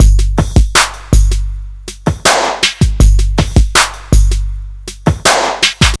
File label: Smooth, R&B Drums; Sonic Foundry (Sony Creative Software)